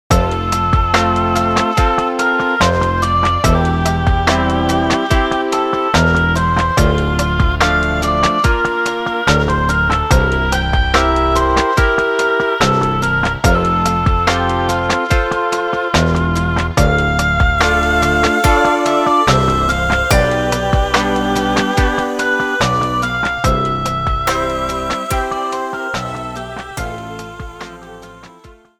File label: concerto for oboe 2nd movement; Bach’s Concerto for Oboe 2nd movement BWV 1056